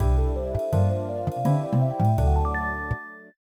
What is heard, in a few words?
Jingle Task Complete